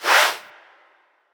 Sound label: loaded frame appear; Butterfly Lovers; wazdan